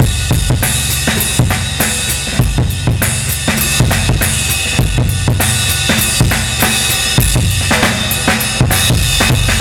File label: 100 bpm, breaks; Zero-G Beneath the Planet of the Breaks